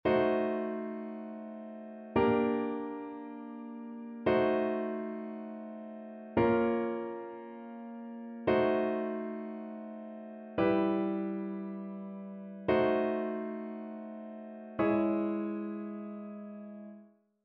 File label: Diminished 7th Enharmonically Spelled